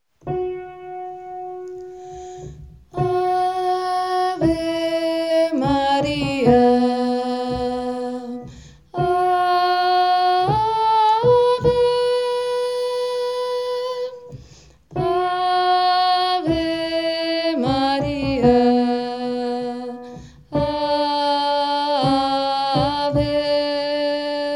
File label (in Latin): ave maria verbum panis soprani